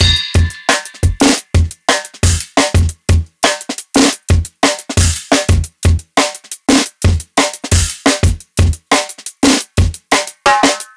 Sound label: Live Jungle Drum Break, 175; JungleBreaks24